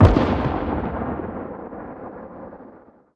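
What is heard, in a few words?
magic shock distant; lightning; skyrim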